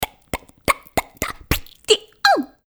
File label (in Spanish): ritmos